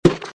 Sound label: wood hit; camp rock; rock n row